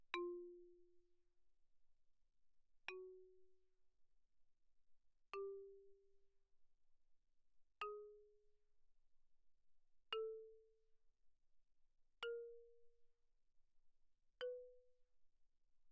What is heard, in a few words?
xylophone hard rubber mf F4 B4; Percussion